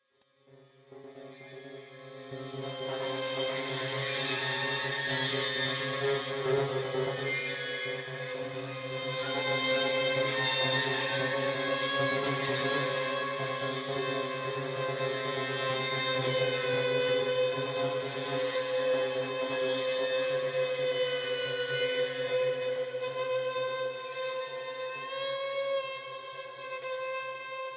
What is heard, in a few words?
Action Drone clusters